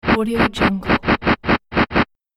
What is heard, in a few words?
rough input data